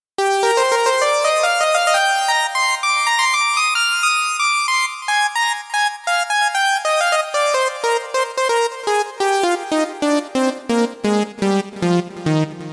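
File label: lead a high c; the transmitter soundset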